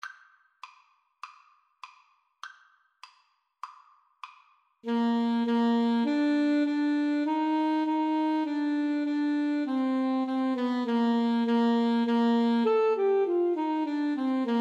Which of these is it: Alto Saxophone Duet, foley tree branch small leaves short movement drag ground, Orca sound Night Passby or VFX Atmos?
Alto Saxophone Duet